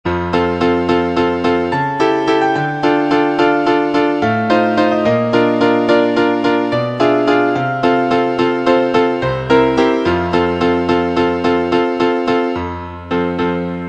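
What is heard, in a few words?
accompaniments, Trumpet in F; concone; Rudimentary Transposition Studies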